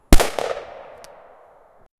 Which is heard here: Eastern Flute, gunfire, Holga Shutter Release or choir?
gunfire